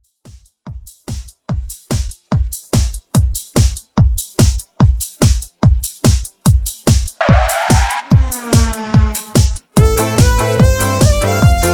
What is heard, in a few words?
Re drum 145Bpm